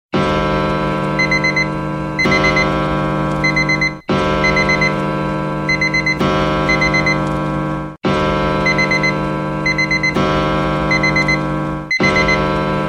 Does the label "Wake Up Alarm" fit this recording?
Yes